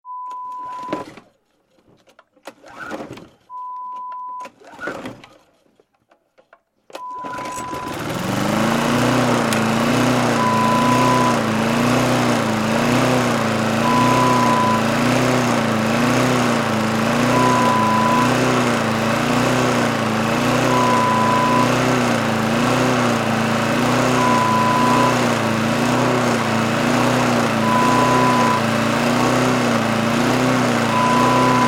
Lawn Mower

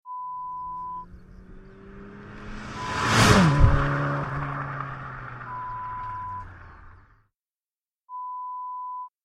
Car Drive by

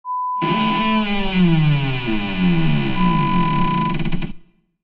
creaking door sound

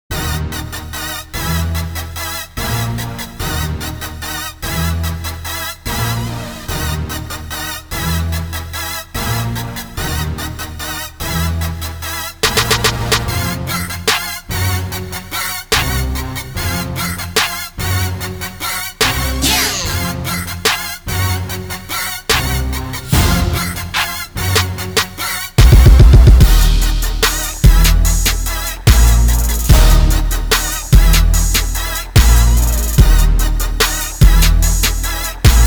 Trap

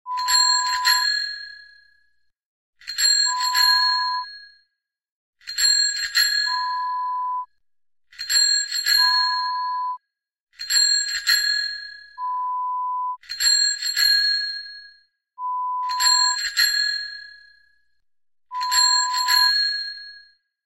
Bicycle Bell

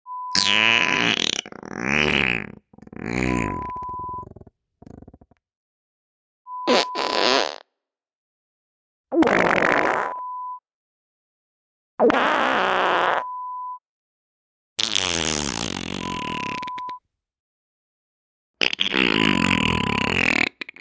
Big Fart Collection

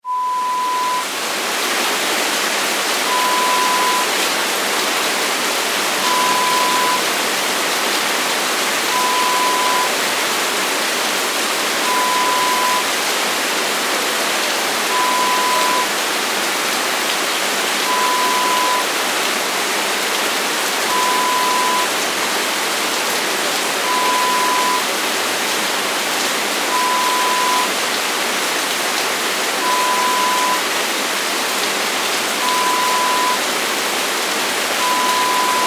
Heavy rain sound

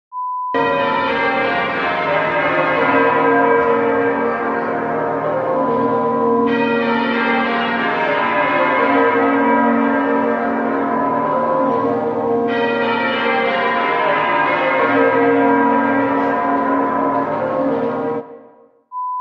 Wedding Bells sound

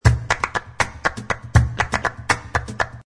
BULERIA AL GOLPE, flamenco

